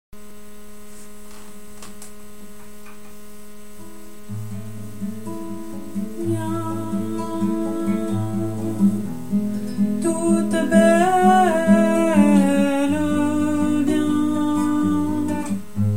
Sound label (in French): voix